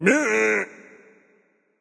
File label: grunt tongue; bacchus